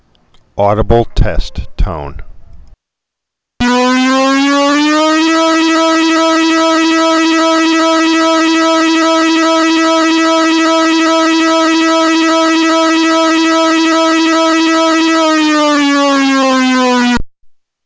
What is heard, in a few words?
Audible Test